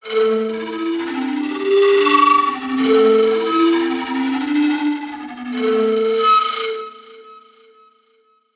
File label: PAN GARGL; eShop